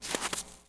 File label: Snow Step, Footsteps